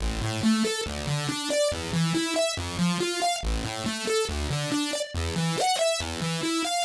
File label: synth tekno 140 A; Techno2; Keys